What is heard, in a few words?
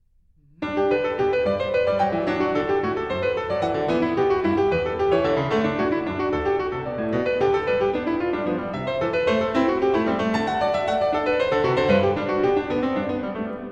Bach Goldberg Variations BWV988 Variation 8 - Allegro